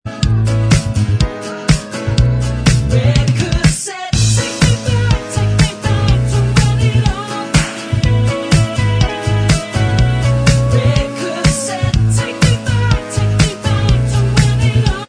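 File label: backing vocals Track, backing tracks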